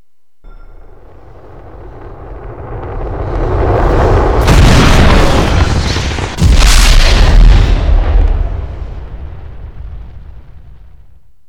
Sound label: jet flyby